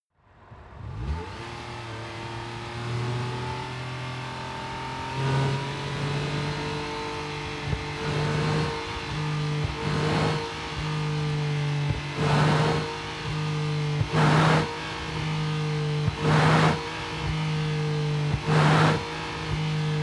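sports car interior engine short thrusts sequence rpm high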